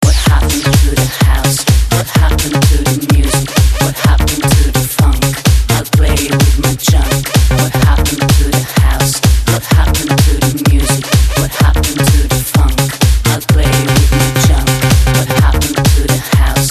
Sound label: house music